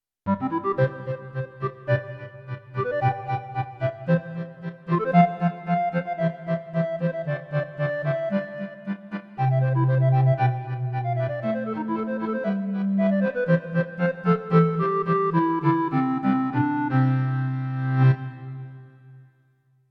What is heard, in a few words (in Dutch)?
Kerkorgel